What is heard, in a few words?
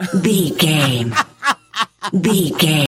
voice male laugh high long